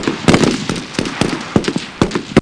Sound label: game over